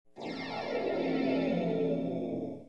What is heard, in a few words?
SPLASH